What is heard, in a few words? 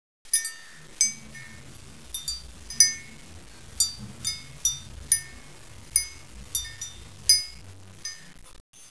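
WIND CHIME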